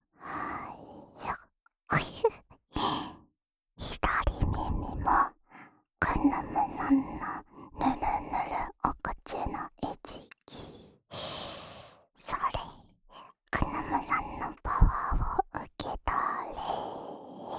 耳舐めループ 左耳, ASMR; 魔族×リフレ×世界征服 ～クヌムさんのけんこーランド～

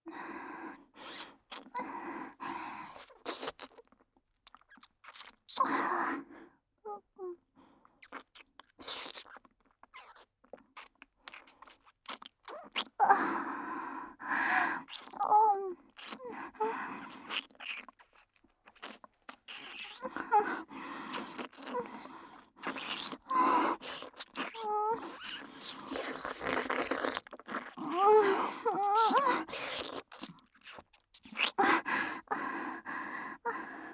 発情ディープキス＆エロ吐息ループトラック, ASMR; スクールカースト上位の生意気JKを催眠分からせ調教

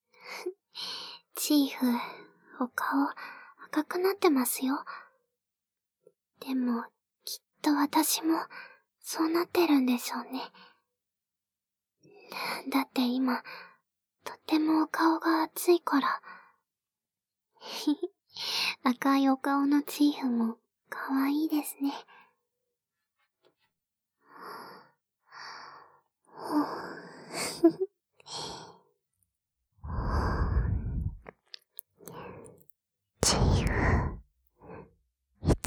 耳舐め, ASMR; Happy☆Prism; アイドル×ASMR

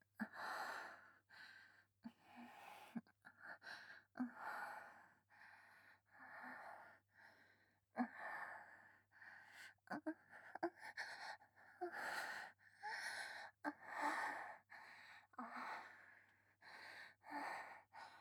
密着 喘ぎ声

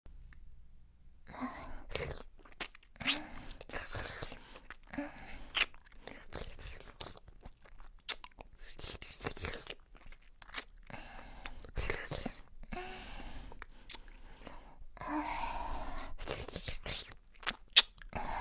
キス; マゾハーレム

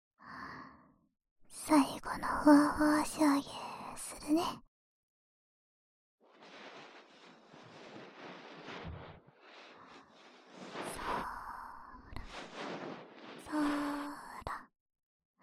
【左】梵天, 耳かき, ASMR

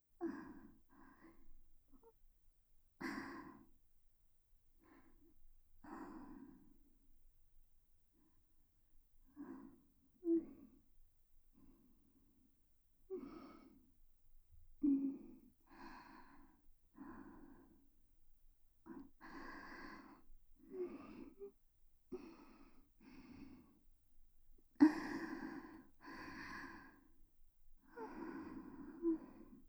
炭酸マッサージループトラック, ASMR; 世話好きJK舞花ちゃん キワキワ水着で甘夏えっち♪お泊り海旅館でいちゃらぶ糖度120%な甘くて爽やかスイートサマーバケーション♪

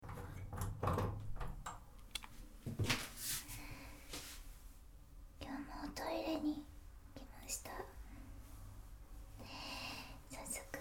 おしっこ, 寝起きおしっこ

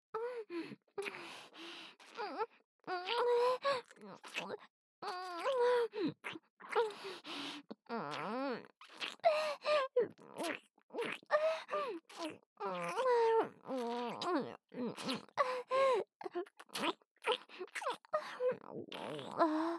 キス, ASMR; アドリブ集

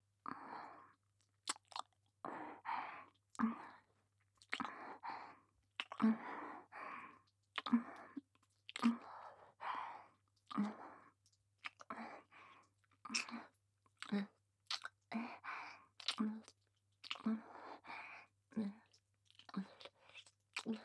全身舐め

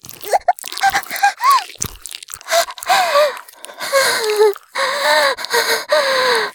絶頂, ASMR; 騎乗位

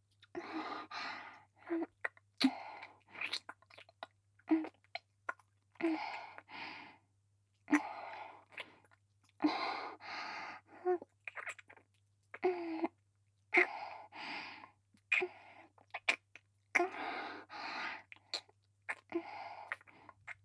ディープキス（ひかえめ）; 性欲旺盛な陸上部の先輩後輩JK、ベロチュー青春溺愛えっちでコーチの汚ち◯ぽ生ハメ特訓♪

